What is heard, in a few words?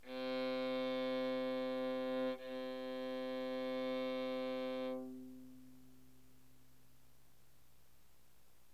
viola C